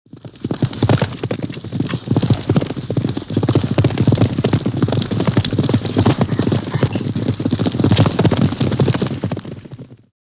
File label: By 6 Horses Gallop Up P, group